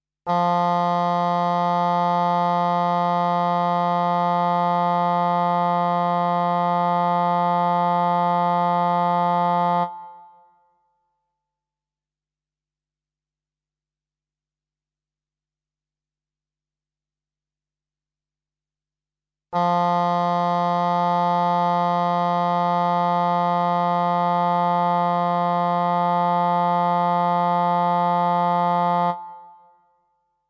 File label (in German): Stimmton f, Klavier; Blaeser-Team